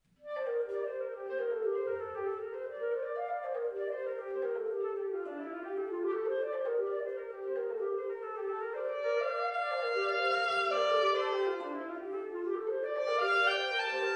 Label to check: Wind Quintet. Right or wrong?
Right